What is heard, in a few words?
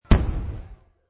Artillery, Artillery shot.; War